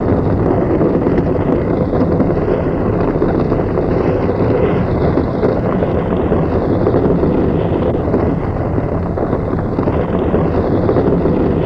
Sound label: Car Tires On Gravel